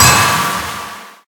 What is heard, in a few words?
hit silence; smite; thanatos